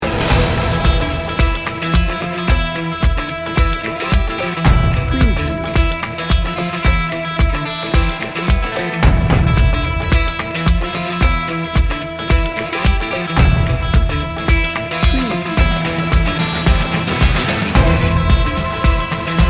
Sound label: epic and determined